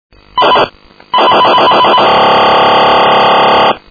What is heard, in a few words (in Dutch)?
afstandsbediening auto; Scanners